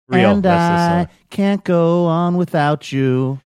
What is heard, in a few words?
singing and i can't go on without you